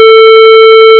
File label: squarewave